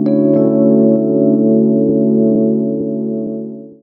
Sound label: Chords Tones; Equipped Music; Loungin House